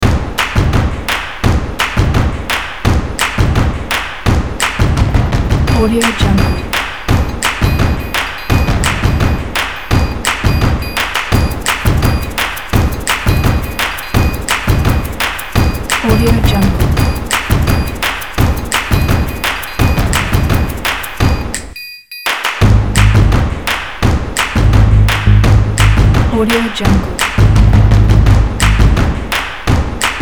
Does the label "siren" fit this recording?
No